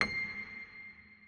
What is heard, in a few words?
Piano c5